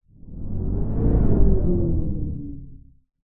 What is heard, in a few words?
rts vehicle move animation particle swish swoosh accent logo sci fi futuristic